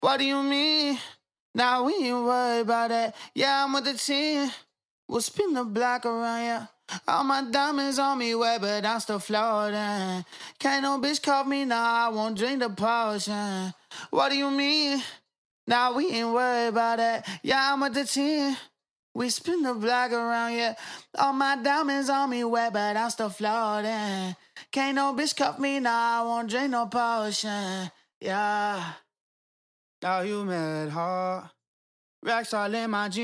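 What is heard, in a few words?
hip hop RNB pop 136 Gm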